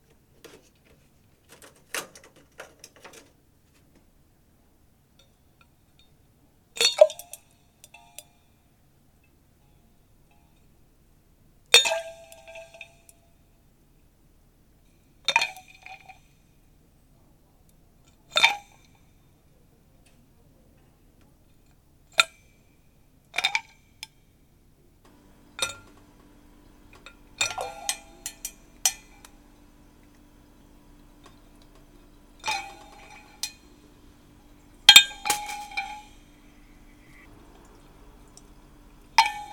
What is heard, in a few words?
ice drops